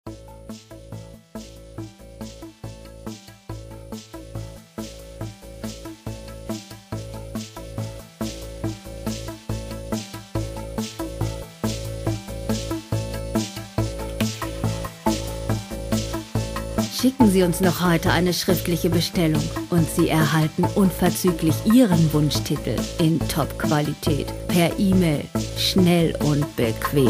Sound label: flutes in april